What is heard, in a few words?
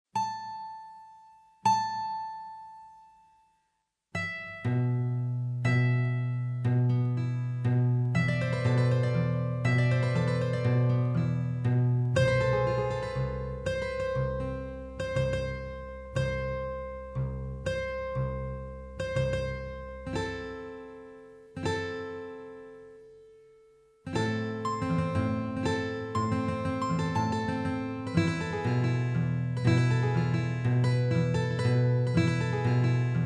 Guitar; Virtual Music Composer 4